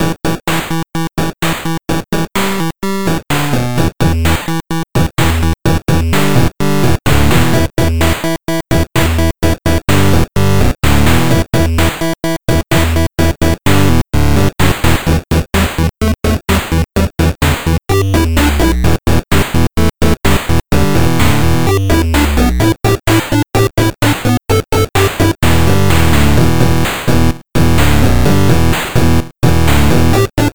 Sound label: 8-bit; Thorns'n'Ropes